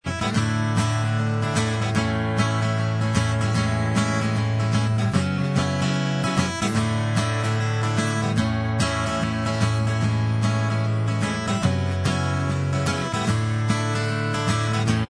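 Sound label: instrumental track, backing tracks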